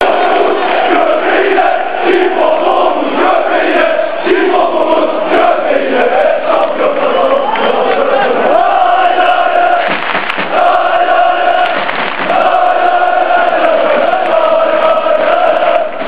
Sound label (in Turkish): mehter; tez